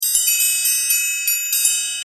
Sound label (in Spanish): bell